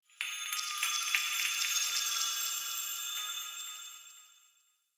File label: Magic Bells D; Fey Hub